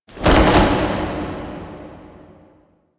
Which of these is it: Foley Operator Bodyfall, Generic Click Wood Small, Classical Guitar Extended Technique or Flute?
Foley Operator Bodyfall